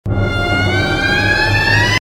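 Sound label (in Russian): леденящий звук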